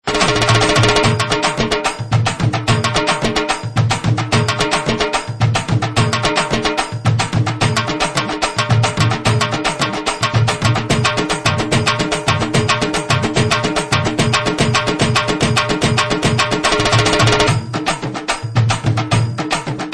Super Beat

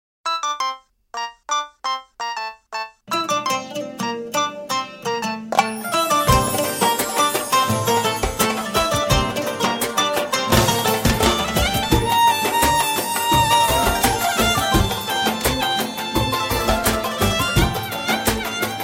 Arabic Cool Music

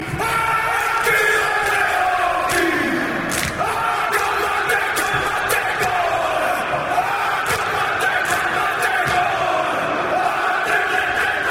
Haka

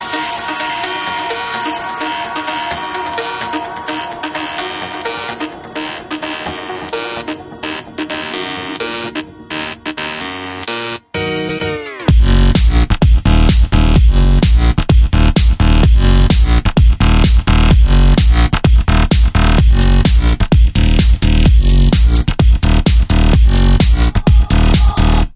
Trance ring

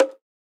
Bonk

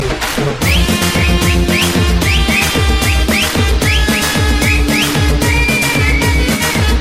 Sms

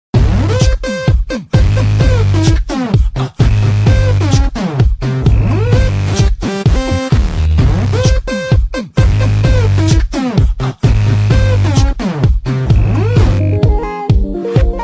tune

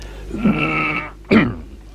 Humm Um

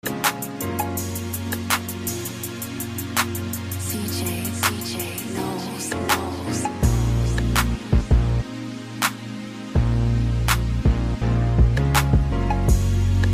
Instrumental

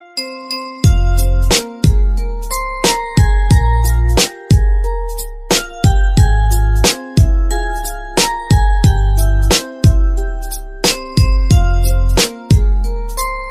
Dragon Strings

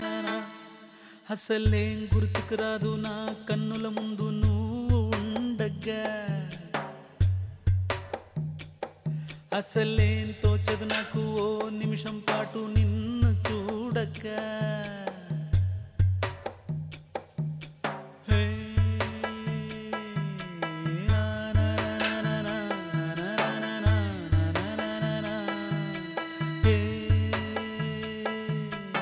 Love Theme Telugu

